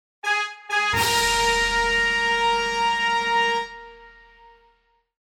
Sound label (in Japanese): ファンファーレ